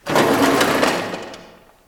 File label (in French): clapet; Feed The Beast